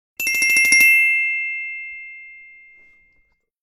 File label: Ringing, ringtones; Nokia; 515